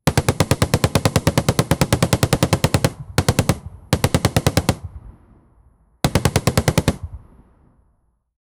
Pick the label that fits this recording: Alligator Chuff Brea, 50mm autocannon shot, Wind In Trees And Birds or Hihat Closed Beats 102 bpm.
50mm autocannon shot